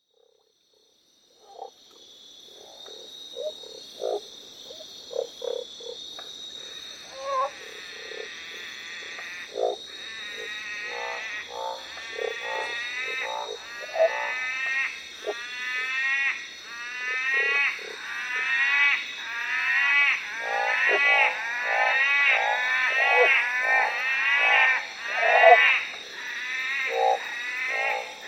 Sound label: northern barred frogs croaks; soundscapes